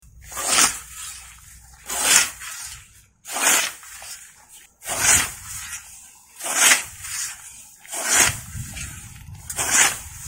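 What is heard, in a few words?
scythe mowing edges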